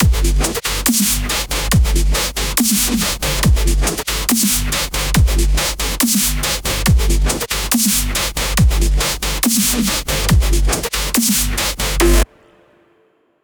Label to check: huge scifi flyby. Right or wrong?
Wrong